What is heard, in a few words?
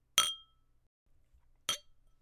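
clinking glasses, cheers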